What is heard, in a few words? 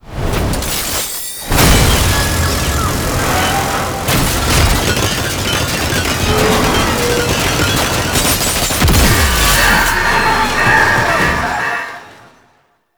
Tank Finisher; Warframe; NineteenNinetyNine; Cinematics